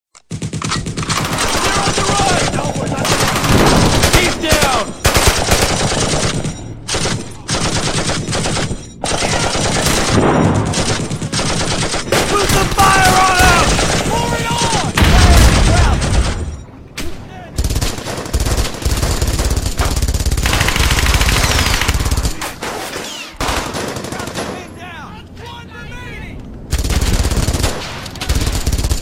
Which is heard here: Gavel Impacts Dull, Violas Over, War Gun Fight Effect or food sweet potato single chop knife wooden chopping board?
War Gun Fight Effect